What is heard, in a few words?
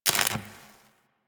Worker Welder Stop; Grn Worker Welder